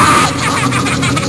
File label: ahaha; Witch From The Wizard Of Oz Laughing